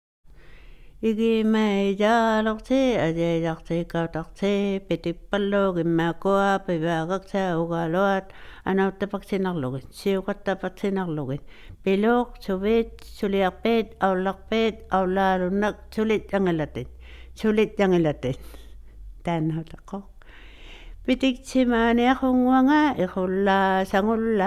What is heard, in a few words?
String Game Song, INUIT